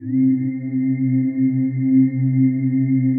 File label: PAD, ATMO PADS; Best Service; XX Large